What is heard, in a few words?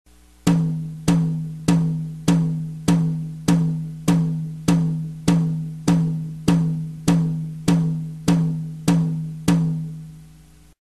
rhythm 12 even beats